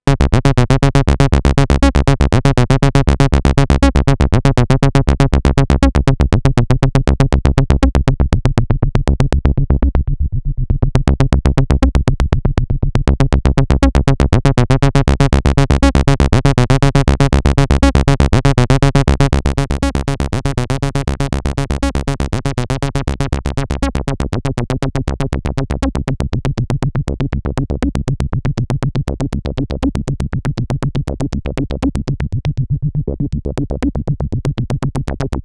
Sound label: env click; click caractéristique des synthés analogiques; arturia origin